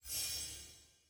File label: ui choice button hover; tft lny2022